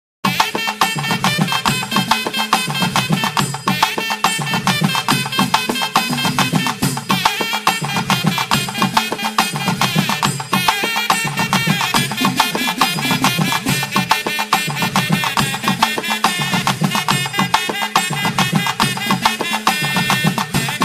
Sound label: folk tune